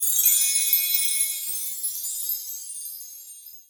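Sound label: World Map Unlock Bonus Stage; rakoo friends wii u 2015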